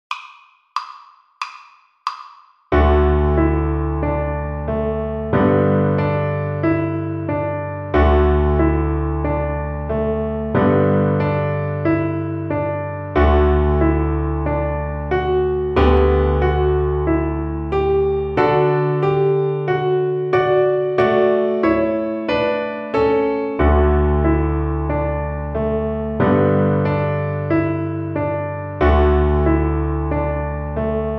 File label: String Gym, Piano Accompaniments